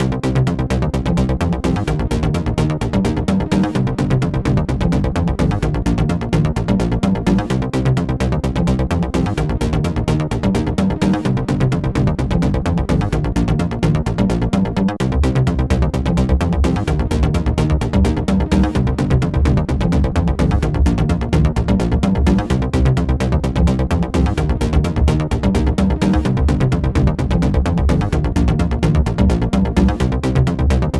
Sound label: 8-bit theme; Ahfee